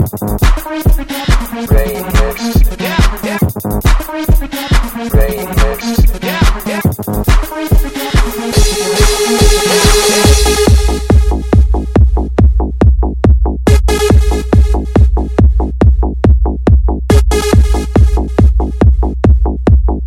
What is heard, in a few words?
techno, dance